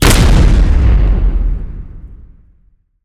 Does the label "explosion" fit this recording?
Yes